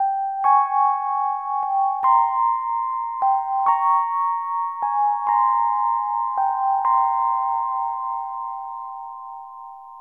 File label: rhodes intro; porkys adventure